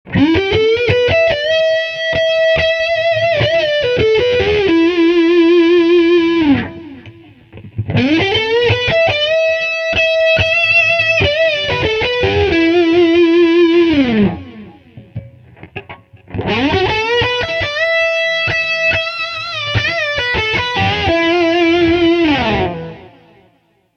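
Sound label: Blues Lick front middle rear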